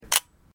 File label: leotax-k, camera